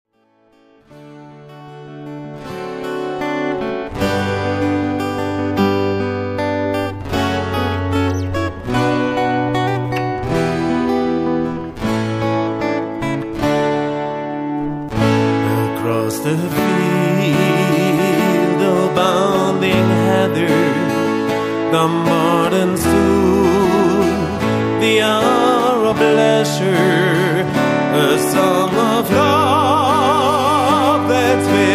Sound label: Drums; Dumbartons